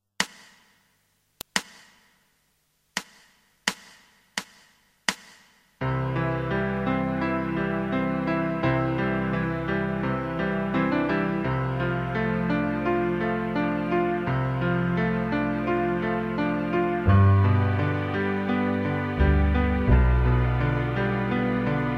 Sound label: Guitar backing